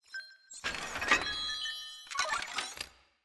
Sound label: scatter Up; netent